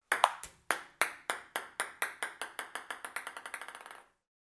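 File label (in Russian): пинг-понг удар в сетку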